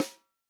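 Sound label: snare drum, Real Drum Kit; POP KIT; Best Service ProSamples vol.43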